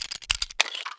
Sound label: lockpick